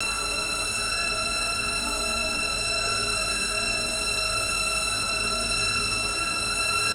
continuous high pitched sci fi energy drone; magic-scifi

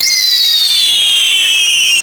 futuristic robot powering up whir

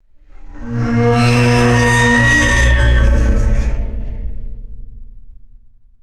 heavy metallic screech drag and clank

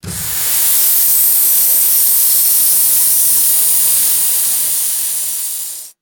loud pressurized air release burst

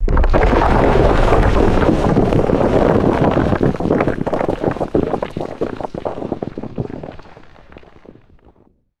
large heavy rockslide tumbling and crashing

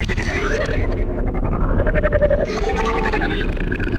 slow undulating sci fi warble; magic-scifi

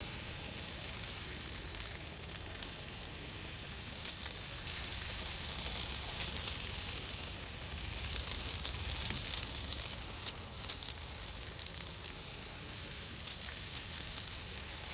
small leaves rustling in the wind; vegetation

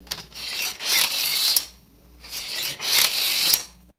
steel measuring tape rewinding and scraping; objects

